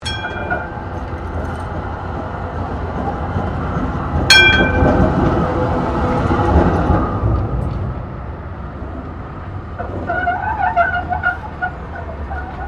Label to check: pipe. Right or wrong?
Wrong